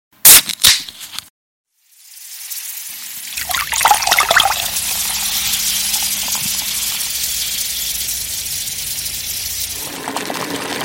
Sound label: cooking ambience; sleep